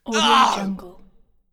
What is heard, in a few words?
male pain